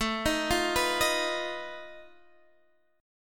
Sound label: F 7th Flat 5th A